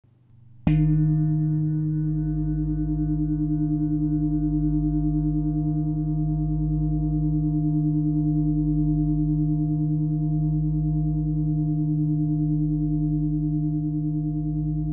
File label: Big Buddha Brown Power